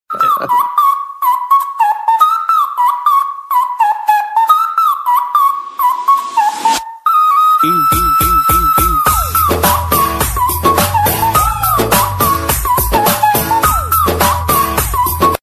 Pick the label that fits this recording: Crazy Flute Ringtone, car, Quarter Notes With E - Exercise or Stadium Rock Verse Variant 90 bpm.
Crazy Flute Ringtone